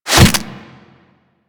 Desert Skate Barb Hit Default A; Warframe; Attacks; Hit Effects

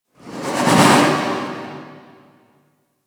Infested Distant Breath, Ambience; Warframe; Corpus Infested

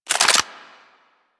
Lex Clip Out, weapons

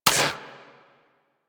Pistol Mech; Warframe; Tenno; Priest; Weapons

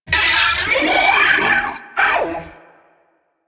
Sentient Ghost Caster Pain, Barks; Warframe; DE; Sentients; Sentient Eidolon Ghost Caster

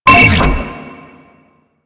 Proto Infested Mutalist Big Land Scream; Warframe; Abilities

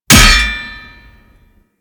parry axe; Warframe

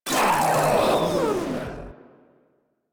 Proto Infested Runner Death; Warframe

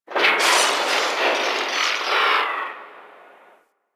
Landscape Construction Site One Off, Ambience

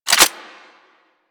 Sniper Rifle Reload; Warframe; Tenno

